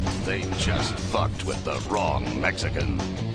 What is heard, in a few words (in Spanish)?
machete